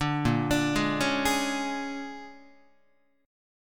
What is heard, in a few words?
A sharp 7th Sharp 9th A sharp down Guitar; A#7#9 arpeggiated; JGuitar